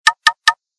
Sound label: Knock; KV920; SMS